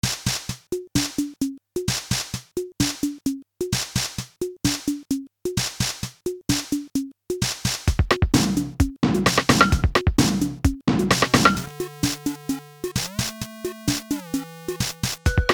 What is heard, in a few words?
offbeat